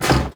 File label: lever up